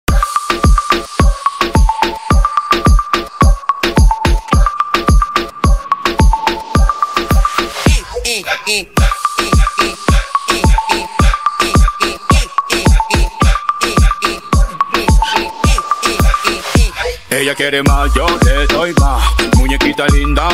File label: arabic remix